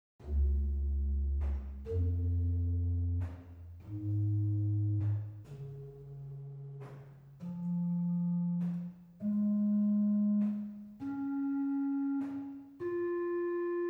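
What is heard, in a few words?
Rohrflöte 8 arpeggio, organ; Silbermann middle-sized church organ; Reinhardtsgrimma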